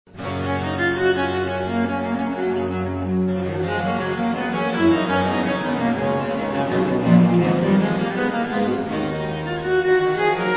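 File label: G major allemande double; Marais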